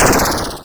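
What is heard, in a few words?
missile spawn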